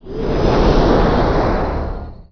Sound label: carryall takeoff; Emperor - Battle for Dune